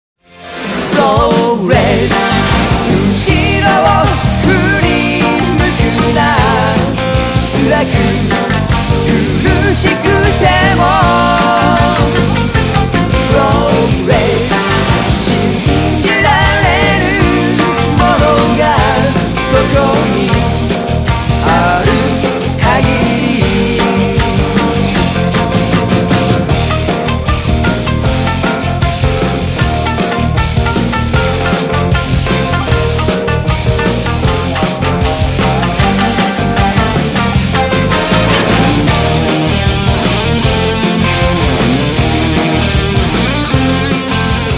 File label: progress